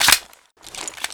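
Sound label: weapon foley mp5k reload clip out; Call of Duty Modern Warfare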